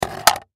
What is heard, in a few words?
Film Slate Set Down, Foley Film Slate Set Down Clapper Clap Board; Set Downs; SoundEffects-Blastwave